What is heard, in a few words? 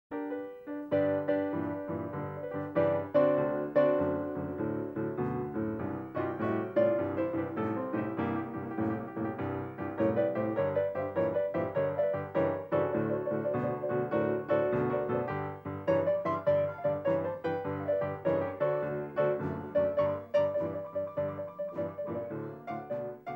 Piano Instrumental